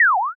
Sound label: slip